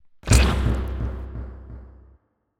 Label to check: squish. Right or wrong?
Right